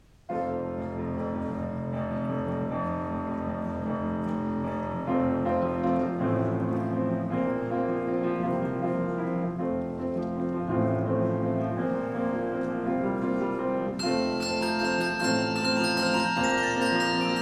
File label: Bells; 2024 fall holiday concert recordings